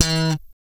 POP F 4, bass; Spectrasonics; Bass Legends

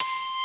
Glass Wave C5; Proteus 2000; Digital Sound Factory Proteus 2000

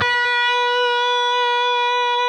PRS C, Guitars, LEAD

